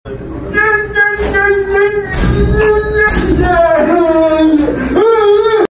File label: ay ay ay ay ay; walter white jr